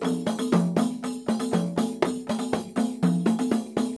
120 KOMPANG, MLY DRMS; Spectrasonics - Heart of Asia CD2

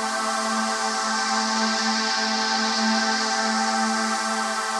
Pad Loop A, shimmer and sparkle

